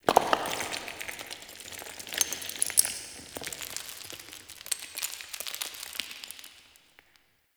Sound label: stone crumble; Ambience; Dagath; Warframe; Clan Dojo